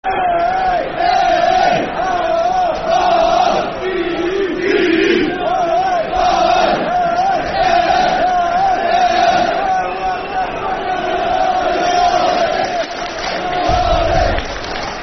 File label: Spell It Out Football Chants (Soccer Songs), Cardiff City; fanchants-com